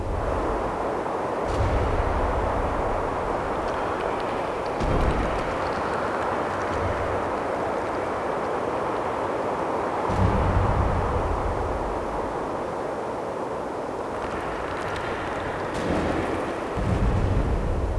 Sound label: ambience city light